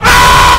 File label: Scream; CoryxKenshin